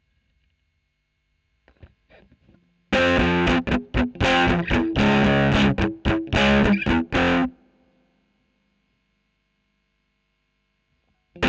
E.GTR Royer

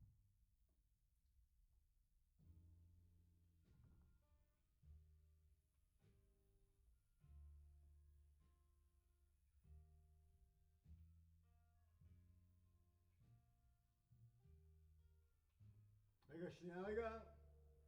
Piano A

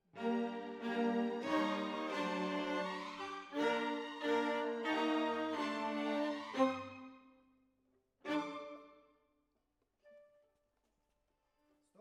Violas, jazz for piano

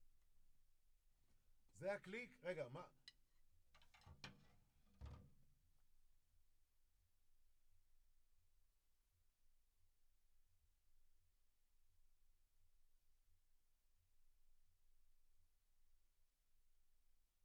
Drums

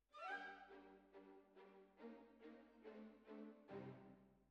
Bassoon, Jazz for piano

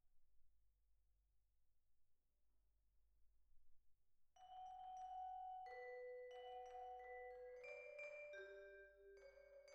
Vibra

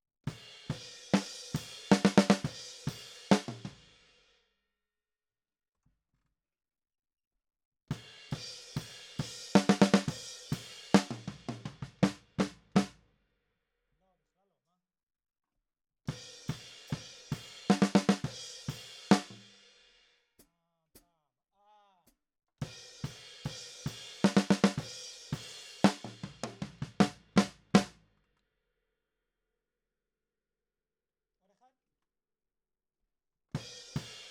Snare

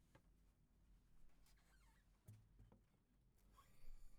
GTR

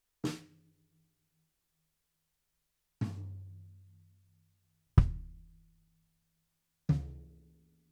BD Out

